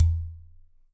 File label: Udu Low